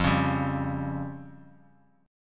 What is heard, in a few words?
F Suspended 2nd Sharp 5th F down Guitar Open D